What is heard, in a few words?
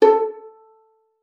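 violin pizzicato A4; Xsample VOL-5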